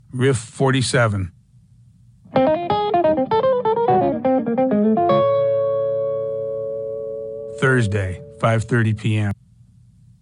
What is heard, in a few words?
Riff